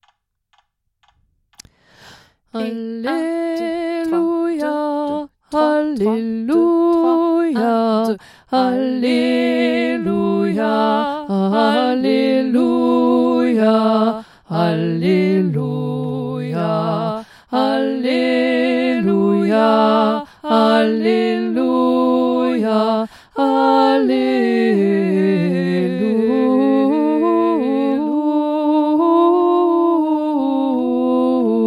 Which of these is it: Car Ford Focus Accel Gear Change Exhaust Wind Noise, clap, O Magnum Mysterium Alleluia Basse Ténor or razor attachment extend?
O Magnum Mysterium Alleluia Basse Ténor